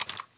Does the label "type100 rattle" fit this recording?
Yes